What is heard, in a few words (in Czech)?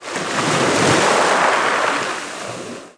Vlny; wob2 demo